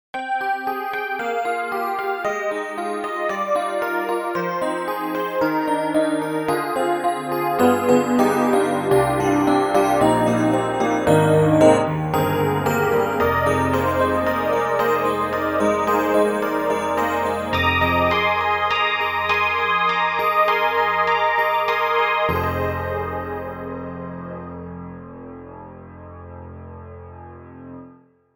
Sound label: 90IS Piano; Cakewalk; Rapture